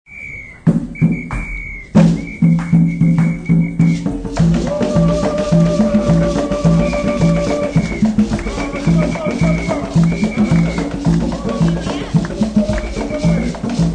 African drums are pounded at festival as crowd cheers and dances.; Concerts; African Drum Party